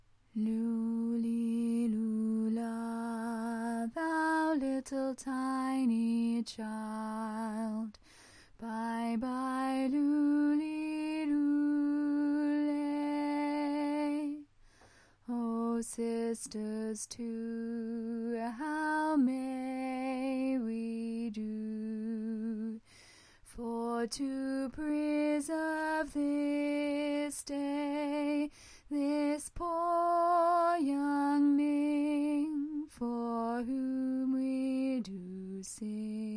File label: Coventry Carol tenor, choir